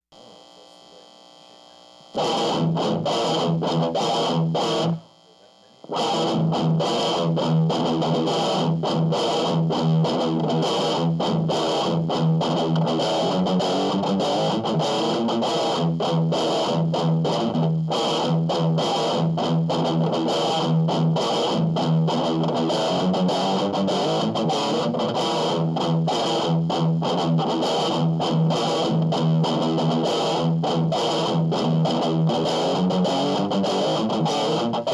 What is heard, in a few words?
Riff